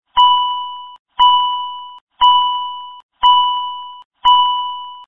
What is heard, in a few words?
sound clock ring; forestparty